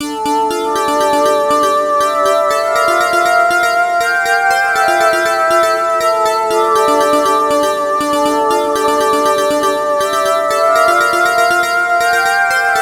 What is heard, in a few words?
exhilarating victory